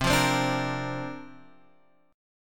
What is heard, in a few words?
C 7th Suspended 2nd Suspended 4th, C, down, Guitar, Drop D